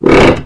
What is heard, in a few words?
coaster creak; random amb sounds